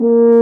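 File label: BRS F HRN, French Horn Swell; Roland L-CDX-03 Disk 2; F.Horn 4 Sh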